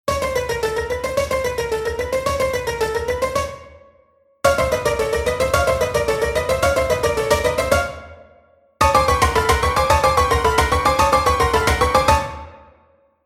prepared piano

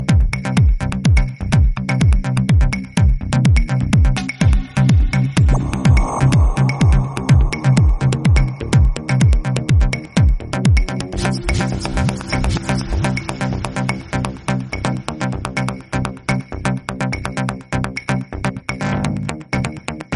french pop

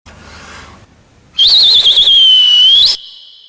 whistle

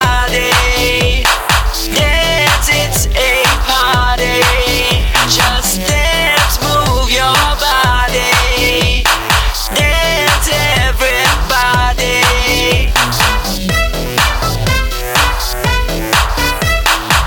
singaporean ringtone

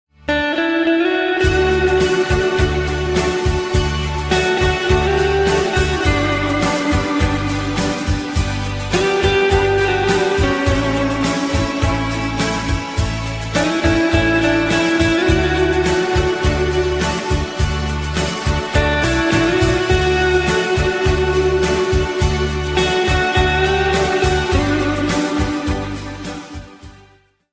german guitarist

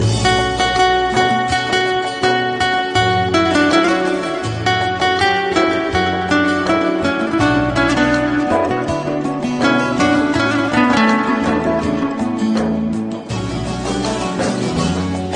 turkish guitar, ringtone

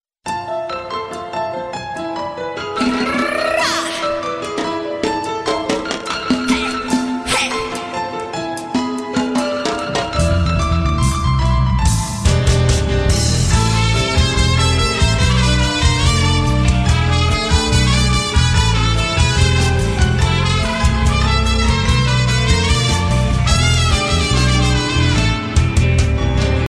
latin fusion ringtone

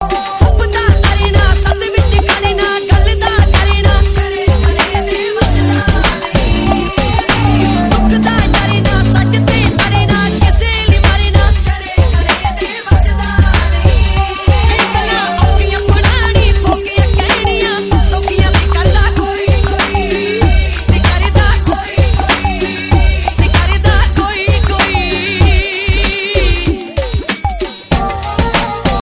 punjabi ringtone

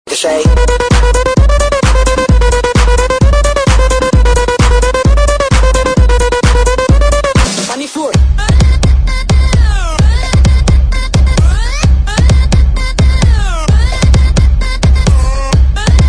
electro house club mix

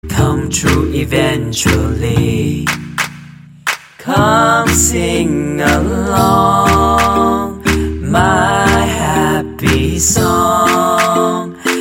a new happy song tune

